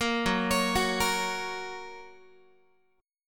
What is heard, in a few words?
Gb Major, Guitar; Gb arpeggiated; JGuitar